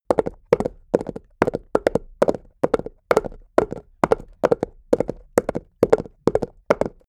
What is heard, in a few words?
horse hooves clack trot asphalt; natur-tiere; pferde